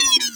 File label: signal; AKAI; FX SIGNALS